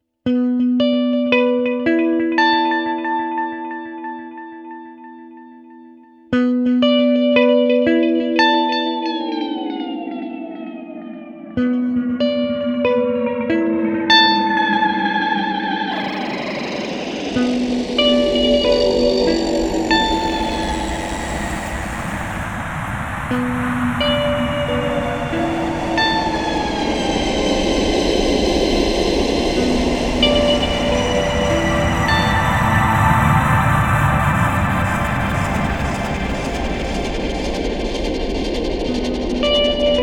Expression Pedal Advanced Modulation

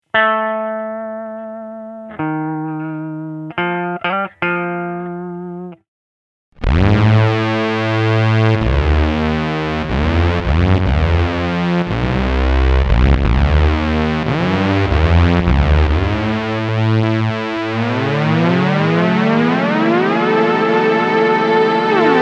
Expression Pedal MonoSynth

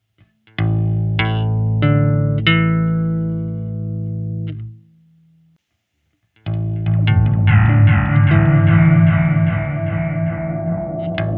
Subterranean Flange Echo

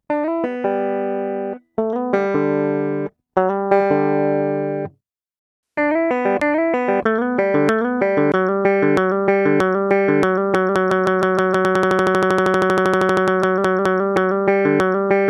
Country Stutter

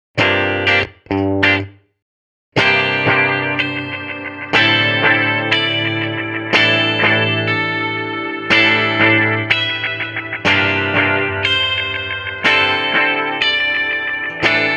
Dark Delay Modulation

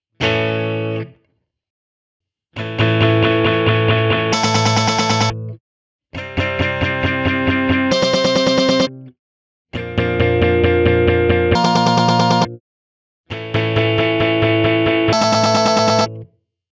Trapped Echoes

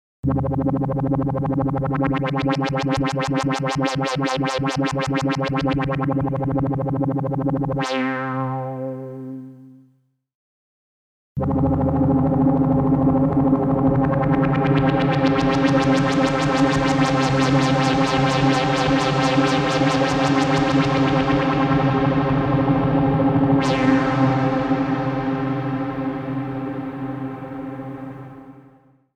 Modulated Space

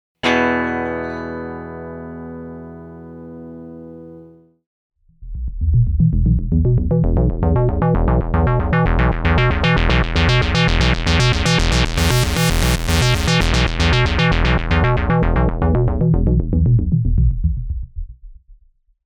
Heavy Arpeggio

